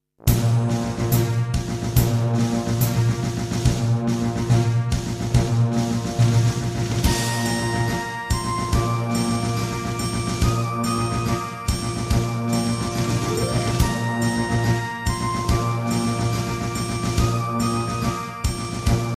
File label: drummer; XMAS